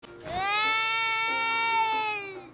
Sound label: cry; golshi